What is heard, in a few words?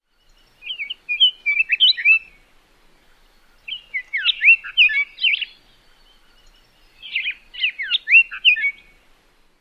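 song, Scott's Oriole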